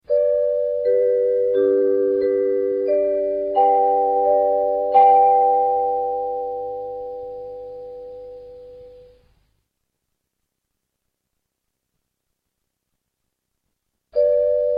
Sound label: Radio Mercur - Pausesignal